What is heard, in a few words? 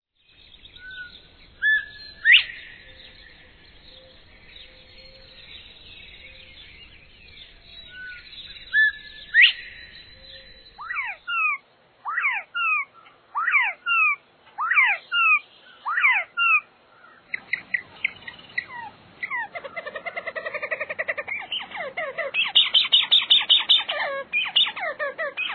Bobwhite, bird calls; Envirothon Jr. High Resources; Jr. High Enviro Wildlife Resource Packet